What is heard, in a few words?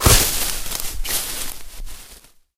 haystack impact; tanks; War Thunder